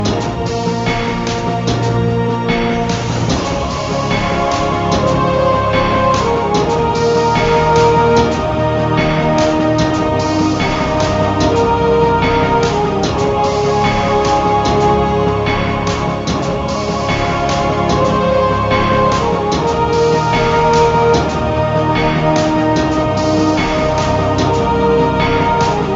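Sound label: Ind Loop, game music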